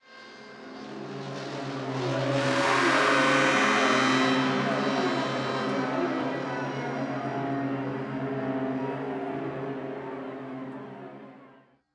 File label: Stinger Intensity; The Park